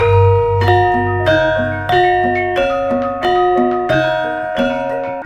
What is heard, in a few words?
GMLN, Gamelan Orch; Roland - LA Composer vol. 1